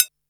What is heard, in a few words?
Boss DR-660 Snare, Drum Machines